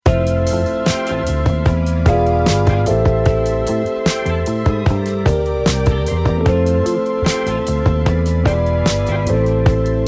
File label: SPL Vitalizer MK3